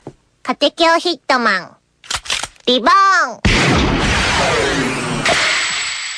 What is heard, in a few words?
Blast; Hitman Reborn